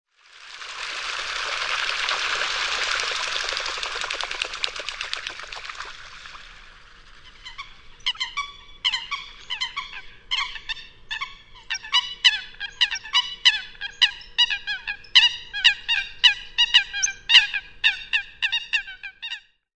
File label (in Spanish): focha comun